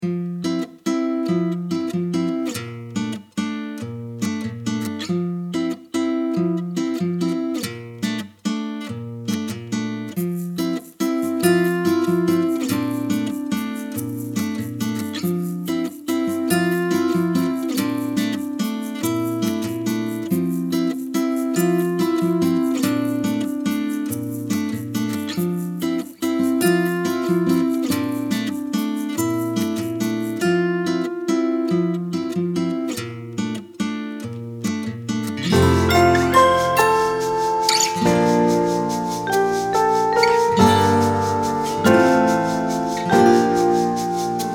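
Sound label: world acoustic instruments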